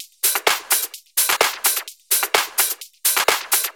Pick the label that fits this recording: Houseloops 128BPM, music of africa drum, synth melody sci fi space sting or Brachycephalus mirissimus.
Houseloops 128BPM